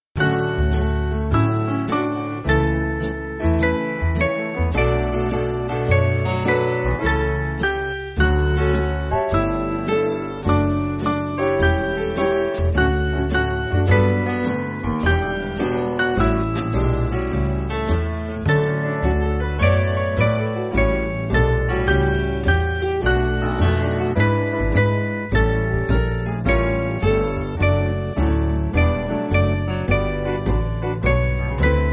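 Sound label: Band, Music Backing; Epiphany Hymn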